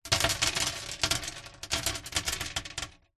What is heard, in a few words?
foley stones small gravel drop handful on empty wooden box lid recorded inside